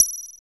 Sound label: FX A, ANALOG; Sound & Vision - Gigapack II